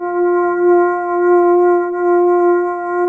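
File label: touch strings F2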